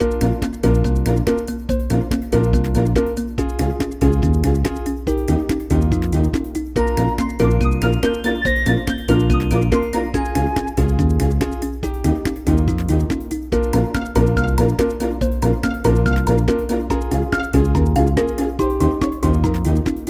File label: Generic Minigame; peppa pig world adventures